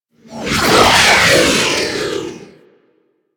Corpus Fighter Ship Close Short Fly By, Space Fighter Ship Engine; Vehicles; Warframe; Rail Jack; Rail Jack Enemy Ships